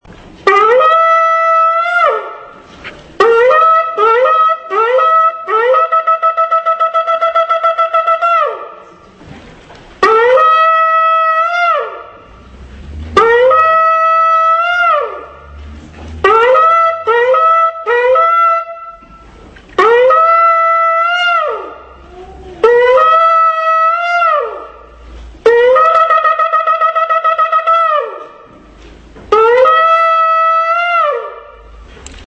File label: Shofar Ram's Horn; Silent Amidah